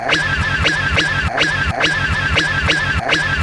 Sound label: Reverse